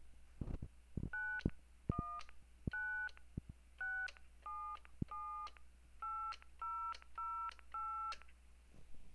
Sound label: DTMF